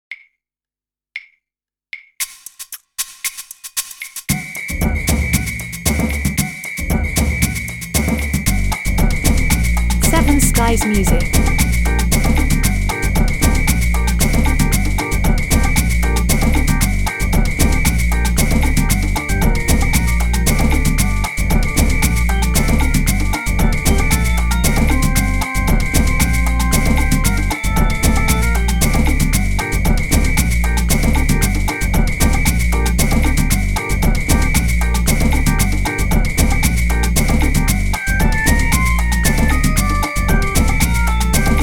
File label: cuban Jazz